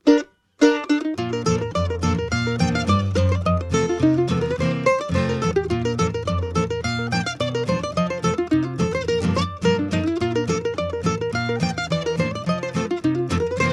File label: Challenge Hornpipe